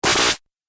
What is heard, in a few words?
Laser Energy Charge; Laser Gun Sound Effects